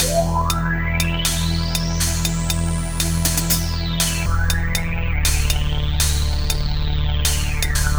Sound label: Zero Beat, Hip-Hop; Sonic Foundry (Sony Creative Software); Hydroponic Hip-Hop; Combo